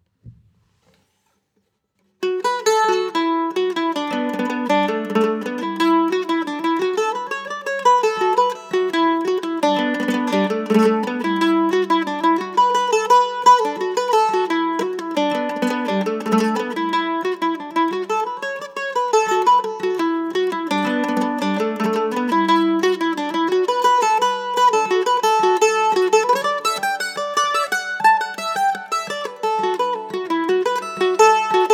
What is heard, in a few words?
mandolin; Hand carved very fine grained King William pine top; Hand carved figured tiger myrtle back, sides and neck; French polish